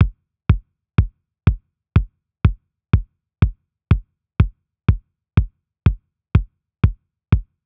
Big EDM Drum Loop Kick loop, 123 BPM; Future House; Future House Tools; Big EDM Drum Loops

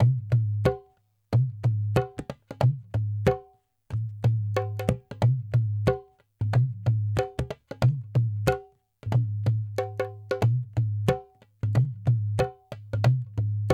dholak 092, Hip Hop India; 092bpm; Hip Hop India Modern Percussion

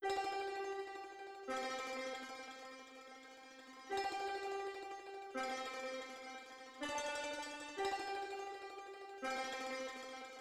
124 BPM C Min Mandolin; Cymatics; Destiny Gold Expansion; Mount Fuji

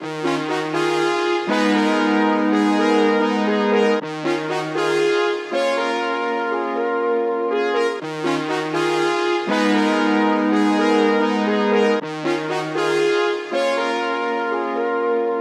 120 BPM F Min Brass; Cymatics; Destiny Gold Expansion; Blue Magic

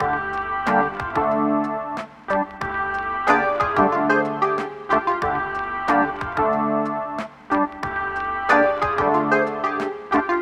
Synth, Hip Hop, 092, Dmin

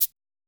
Hat A Hit; 090 Emin

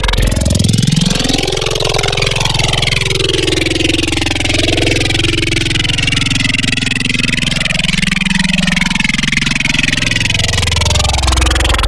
Heavy Neuro, Wavetables; Cymatics; Secrets Wavetables; Serum

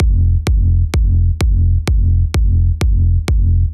Big EDM Kick Loop, 128 BPM; Future House Tools

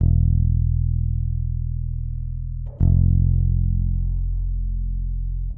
Bass, Hip Hop Exotica, 086 Emin; Apple Loops; Big Fish Audio

